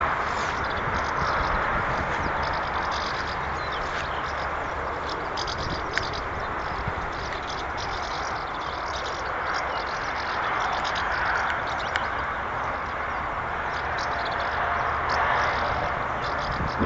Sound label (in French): hirondelle de fenetre